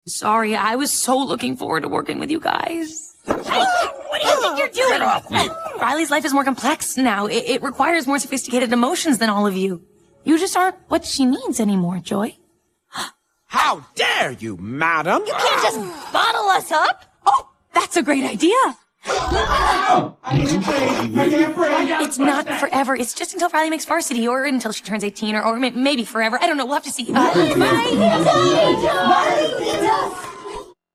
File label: vocals